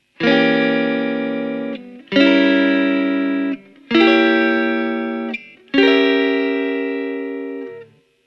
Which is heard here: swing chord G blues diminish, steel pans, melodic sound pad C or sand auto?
swing chord G blues diminish